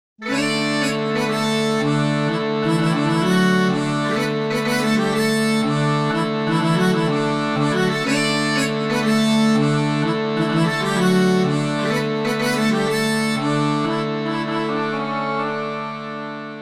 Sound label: Music Bagpipes Solo; Curse of the Sea Rats